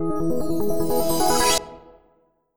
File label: splash